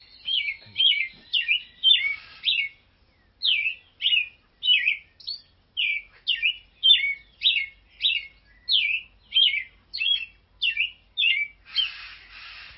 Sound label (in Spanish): mirla siota, aves